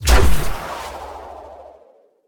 Ball Cannon Fire; Deathrun

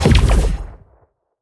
Jump, Boost Pad

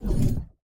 Hand Paddle Release Med

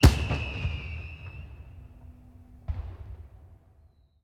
Celebration Fireworks

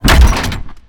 tackle; Player Tackle

